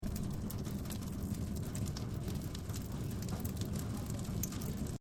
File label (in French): feu